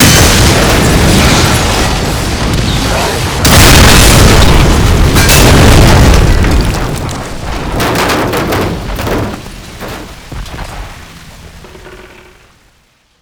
t72 explosion; Call of Duty Modern Warfare

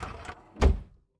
Aircrafts Bf-109K-4 Canopy Close; DCS

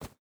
footstep barefoot dirt run; Rust

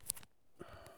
item medkit injection; Escape from Tarkov

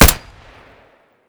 glock17 close; Escape from Tarkov

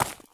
run slate; Escape from Tarkov

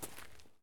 walk concrete; Escape from Tarkov

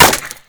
impact wood; Modern Warfare

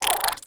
tunneler worm biocharge

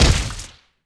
Death Hit; Civ4 beyond sword

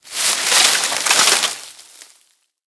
tank collision bush; tanks; War Thunder

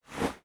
Inf Weapons Foley; Red Orchestra 41-45

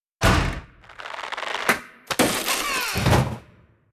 ambient port; World Of Warships; port3d

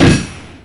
Damage Internal Cannon Impact; DCS

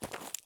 walk slate; Escape from Tarkov

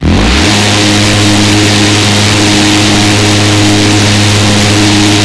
Automatic GShG, Weapons; DCS

